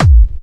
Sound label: Harder, kicks